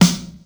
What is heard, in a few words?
acoustic snare f key; Mono; snare-drum-samples